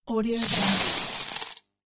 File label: golden coins falling